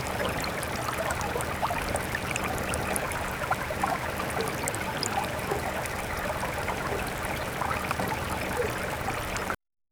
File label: high fidelity stereo fiel, river